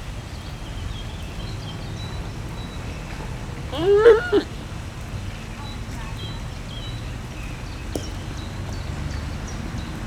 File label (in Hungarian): bátortalanul nyekereg, házi kecske; debreceni zoo 2012